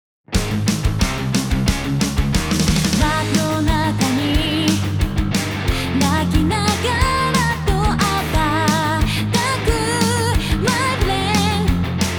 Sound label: drum On